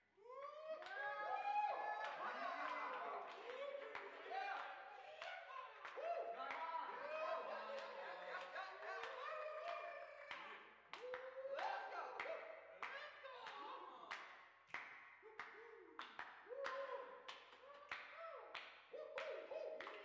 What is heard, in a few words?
Jazz Club Clean